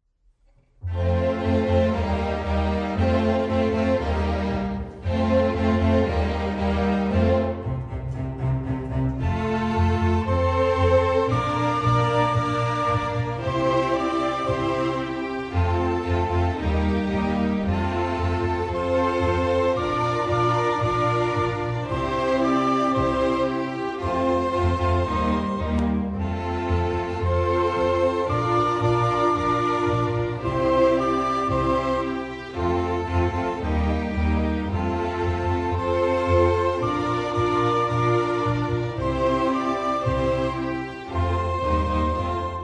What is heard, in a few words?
Rock orchestra